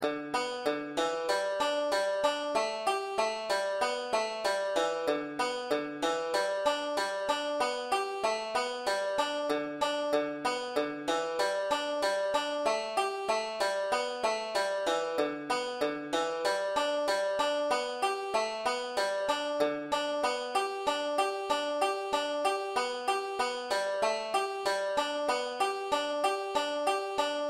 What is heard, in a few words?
brethren we have met irish banjo g solo; easy tabs